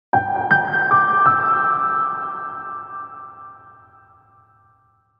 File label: dream piano